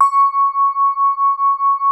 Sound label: SOFT C#5, synth pluck; Music Store - Claudius Diemeter Schwerlos